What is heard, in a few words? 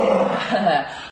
hihi